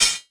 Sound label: Crash Cymbal